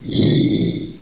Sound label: Zombie Breathe; Knight Online